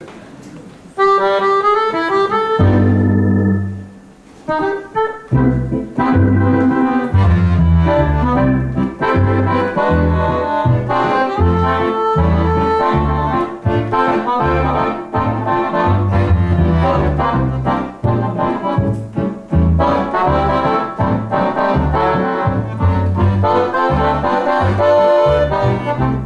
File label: Accordion Players